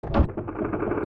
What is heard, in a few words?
Coffin Open; zelda; OOT